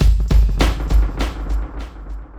drbreak, drumbreaks; Masterbits; Vinyl Attack

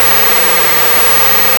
GEIGER, Hard & Loud Techno; USB Soundscan vol.01; AKAI

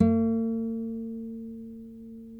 NYLON NO VIB, Guitars; Spectrasonics; Hans Zimmer Guitars VOL-1

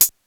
808 CL HAT, TR-808 KIT; Greytsounds VOL-1

